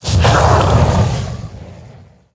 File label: Molten Rock Attack